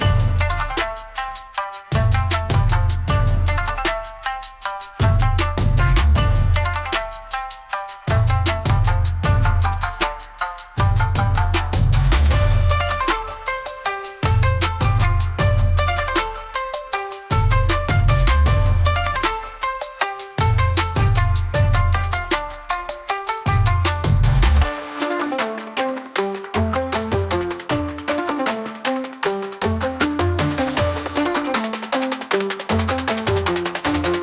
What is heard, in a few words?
Bass Pulse, Japanese; Tokyo